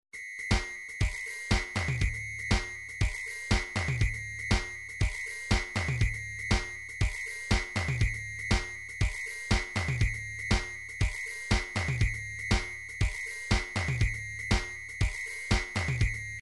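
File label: drums pattern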